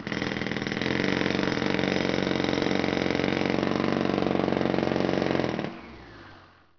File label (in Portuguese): martelo pneumatico